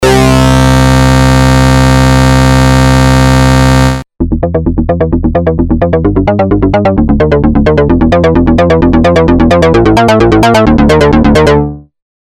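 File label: NBS2 48kHz Org Sample velocity to VCF; EIII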